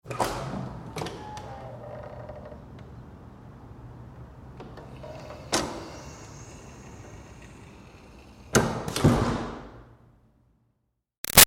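Door Metal Commercial Push interior Med open-close; Metal Doors; Big Room Sound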